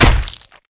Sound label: Dmg Sword Cloth